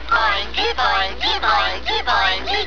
BOINGY

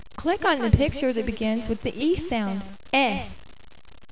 EHH

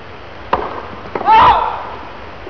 TENNIS

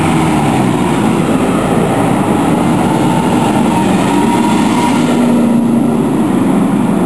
BUS

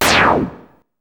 WHIT NOIZ; BEAT2000

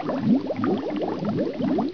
FUEL BUBL

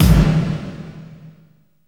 low gong; BEAT2000

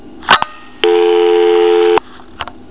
PHON DIAL

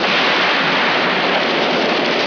EXPLO

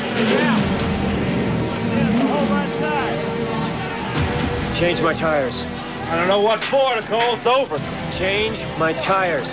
tires

